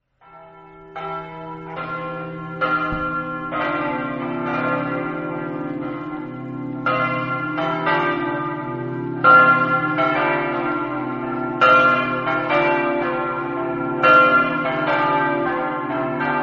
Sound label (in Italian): campane